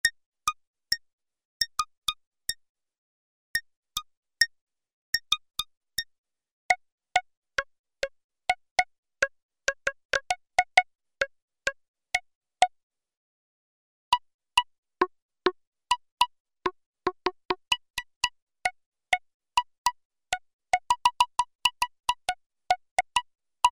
CLAVE, Oberheim OB-8; Factory Patches

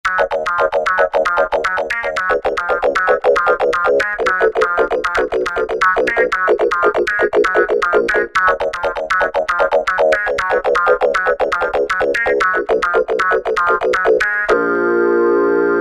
Fuzz Felt

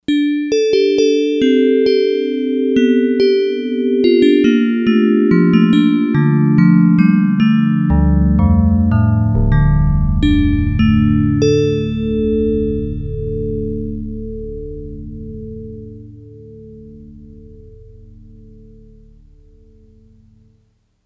Vibrabelle, Yamaha DX100; Factory Patches

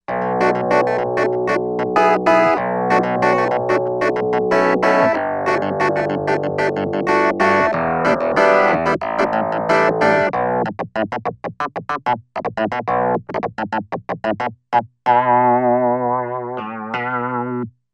Thick Clav

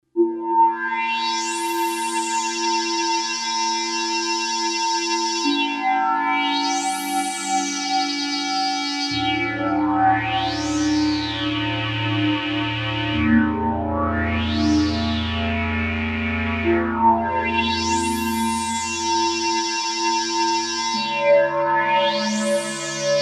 Ring Pad

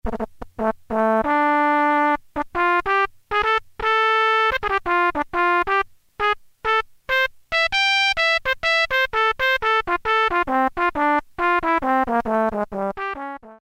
trumpet, Technics SX-K450

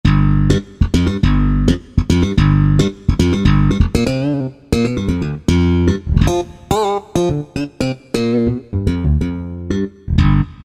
slappin', Korg N364; Factory Combi demos

